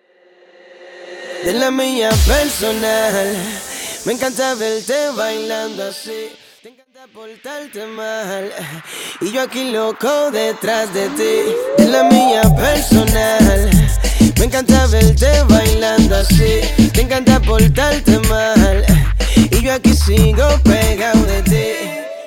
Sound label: Acapella Starter 93BPM